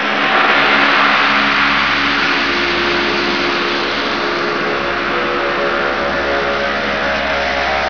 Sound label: power machine, ambient objects; hl2